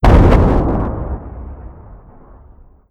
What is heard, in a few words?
big bomb far; snds jack gmod